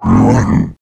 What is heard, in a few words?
attack, male zombie; nmrih; zerosiki; slender